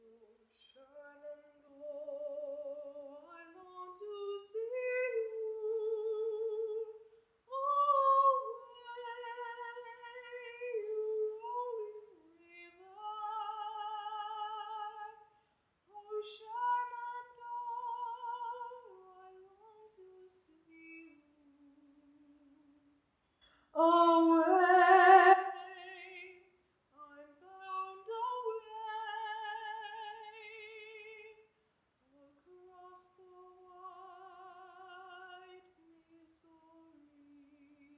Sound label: Voice Cello